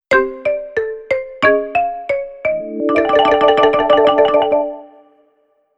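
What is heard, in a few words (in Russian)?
неторопливо веселая анимация перехода звука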